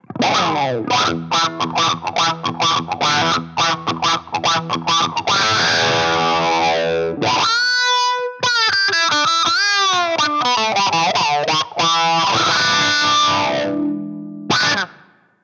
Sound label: Drive Range